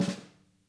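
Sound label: snare, Interactive Drum Kits; Sonic Reality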